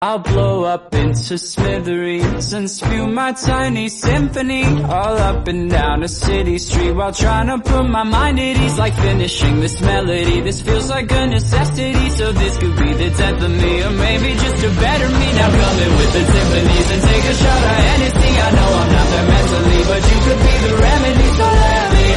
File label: remix